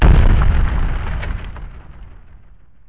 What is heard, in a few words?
boom; The Elder Scrolls III Morrowind GOTY; XBOX